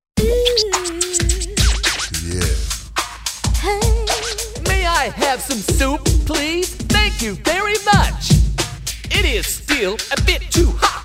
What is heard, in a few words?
Rap